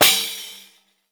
DEEP GOAT, GOATSKN TAMB; Voice Crystal - Steve Reid Definitive Percussion

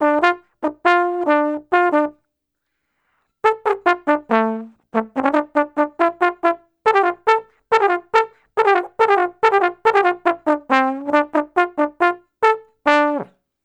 Trombone Solo, Jazz

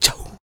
FEM PERC, Vocal Jazz FX

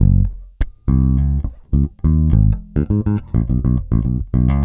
Pop, Bass

